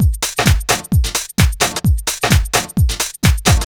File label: DRM 130, LOOPS 130, House; Best Service ProSamples vol.10 - House; AKAI